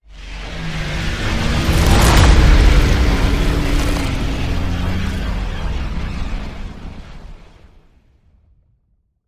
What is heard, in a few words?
Kraken Deep Abyss Hit